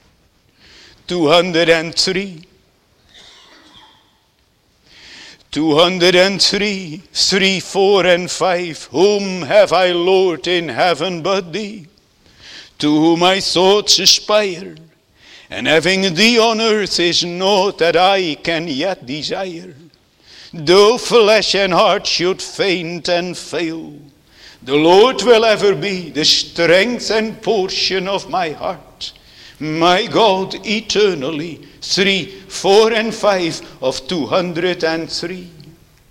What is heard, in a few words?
Singing; The Desire of the Spiritual Bride; song of solomon 1 2